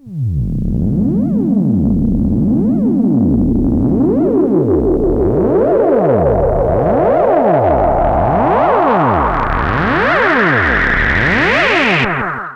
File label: 60s Sci-Fi, atmos